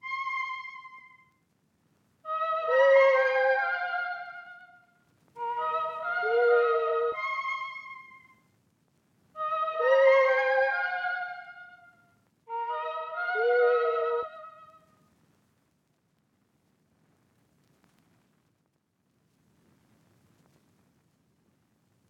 135 BPM F Min Flute; Loop Stems; Cymatics; Agua

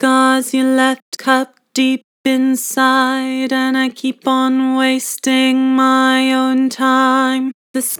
Vibrato, Vocals; Scars you left; Harmor Vocals

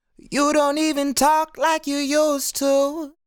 Sung Phrase Dry, 70 BPM G# Min; Cymatics; Euphoria Vocal Sample Pack

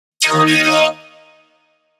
Vocal 140 (D#); One-Shots; Future Vocals; Turn it Up; Ghosthack; Color Bass Essentials

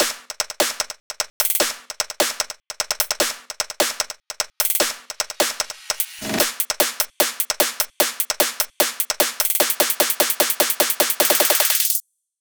Future Bass Buildup Drums 150 BPM; Cymatics; Future Bass Starter Pack

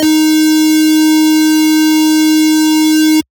Lead Shot D# Solo, Dubstep; One Shots; Ghosthack; Dubstep Sound Arsenal

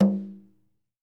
Sabar Drum - G; percussion; Cymatics - Infinity Beta Pack 2.0; Zeus Percussion Collection

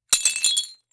Glass Debris; Fairlight Sound Lib

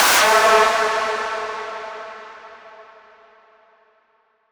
Fx 130, Techno; Techno Sounds 2024

